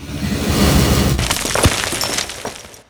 Gate Open

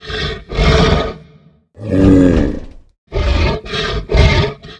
battle stand

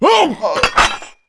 die; BackShadow

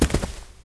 Dog Foot

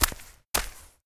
Crane Walk

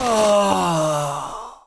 Die

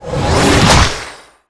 Fire Shella skill

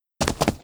Goral run

